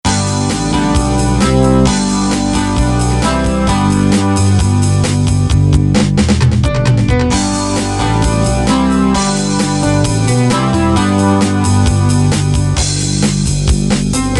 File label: blue sky of the winter instrumental pop